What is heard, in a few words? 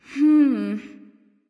NV Thinking; smite; awilix